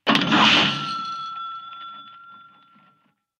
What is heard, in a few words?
coin sfx; cuphead